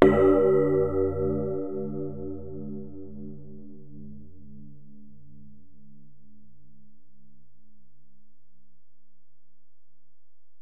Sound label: Bell, Alesis DM-PRO